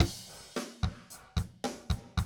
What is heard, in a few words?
drum loop, 100 BPM; Best Service; XXL 1500 DrumLoops; Sample CDs